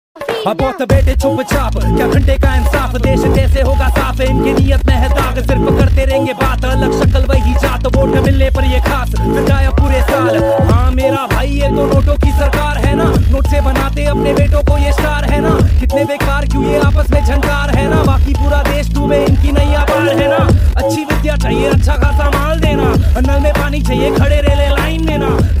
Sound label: Rap